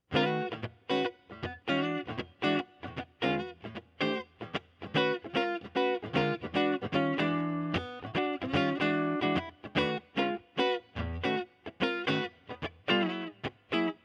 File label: scooped mid jazz neck